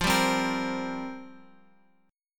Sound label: F Suspended 2nd Suspended 4th, down, Guitar, Open F; Fsus2sus4; strummed